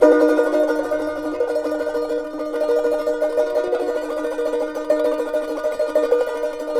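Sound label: CHARANGO, C major, tremolo; Spectrasonics; Hans Zimmer Guitars VOL-2; CHARANGO CHD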